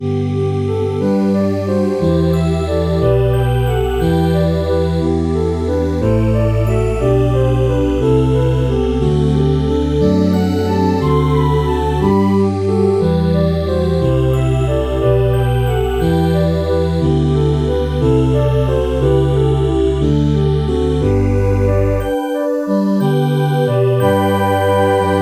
space voice

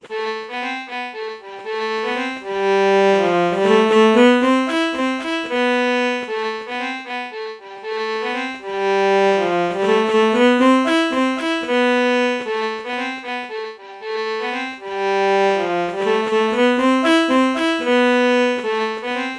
alto saxophone violin melody morph low

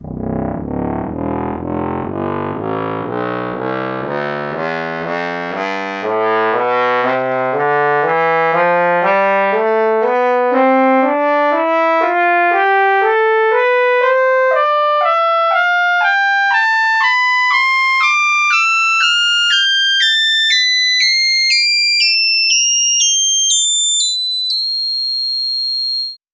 trombone tonleiter

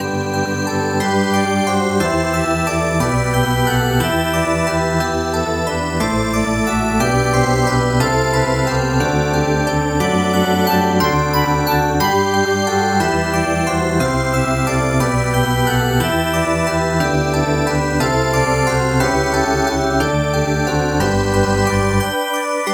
bowed glass

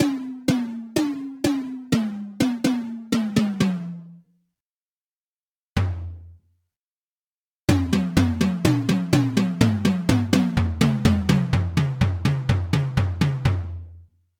melodic tom

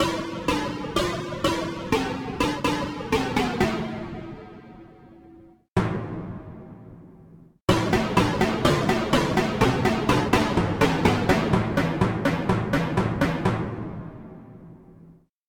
timpani

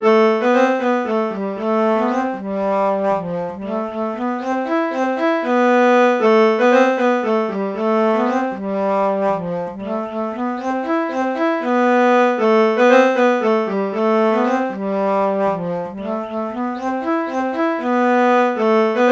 reed organ alto flute melody morph low

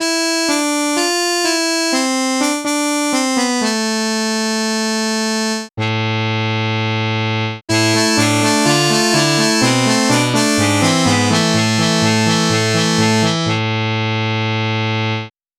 muted trumpet

